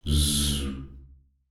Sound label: Vocalised Buzzy Whoosh